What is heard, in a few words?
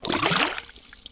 splash